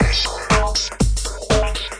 splurty house, 120 bpm, drum loops and breaks

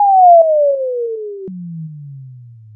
roller disco bomb drop; Punches Hits Discoblasts; single hits

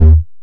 bass; SCARs FNC Bass

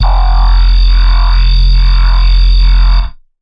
synth bass thin filter; multisampled; synth keys

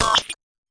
fx drum

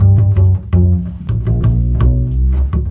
165 acbass doubledown

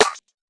tight hit, Tight n Hard Kit; Hip Hop; STYLE KITS (Hip Hop, Etc)

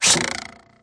vintage whizz twang; Cartoon Sound Effects Kit

